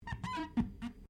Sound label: Glass Squeak Rubber; Material components for constructing more complex sounds; Materials; Foundation